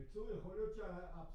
C Bass

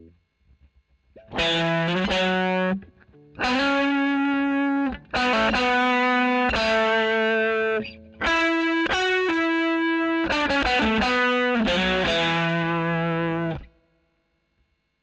E. GTR Royer OD

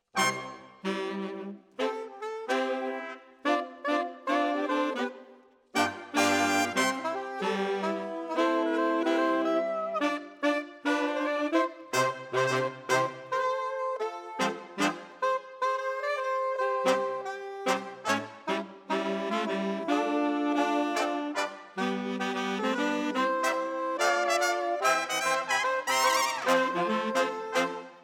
Sax

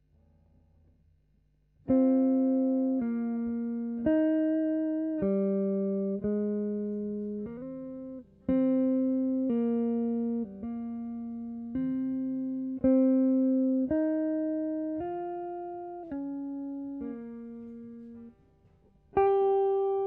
GTR B

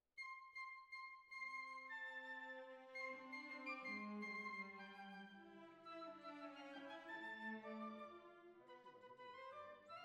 Violins Over

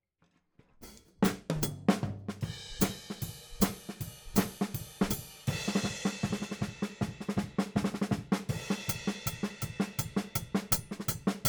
Hat

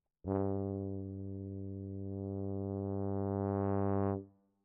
Tuba, jazz for piano

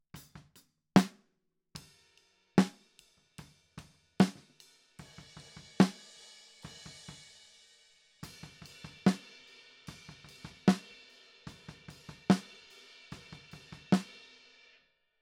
Snare Top

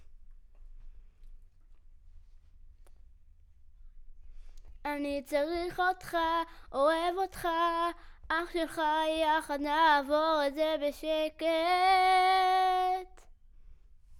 Children Choir; Studio Bekol Children Choir

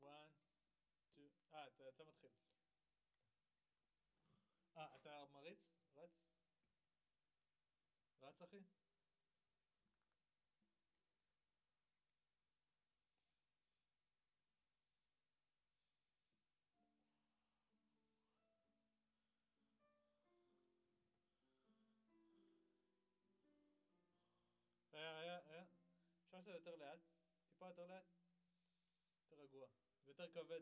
SNR

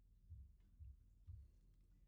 Trumpet

